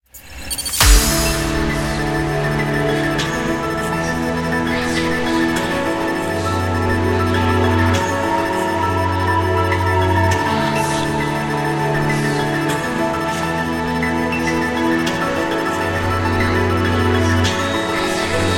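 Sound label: background music crossfade; Invaxion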